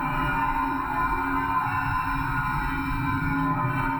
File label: Tonal Re Draw, sparse soundscape